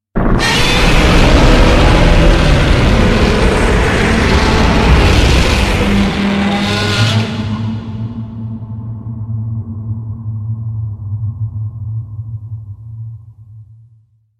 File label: Roar